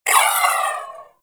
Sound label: Briefcase Akimbo Pistol Alt Proj Whizzby